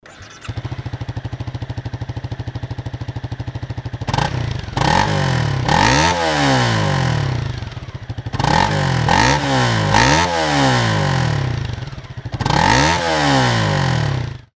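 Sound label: cb250r muffler, motorcycle; mc52; rrmuffler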